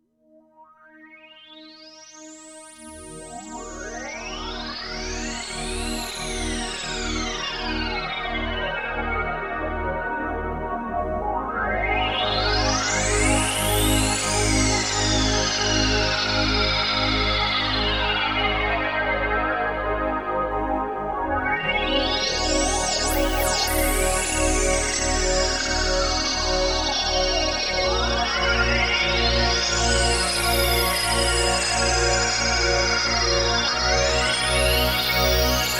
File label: ju60 resonance Pad